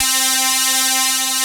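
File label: P5 guitar pad C3; VINT.KEYS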